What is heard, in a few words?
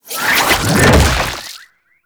Gouache Teleport Out C; Warframe; Follie